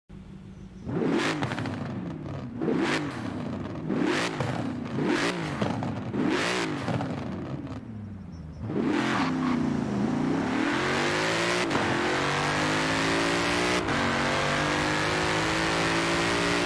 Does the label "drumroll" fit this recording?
No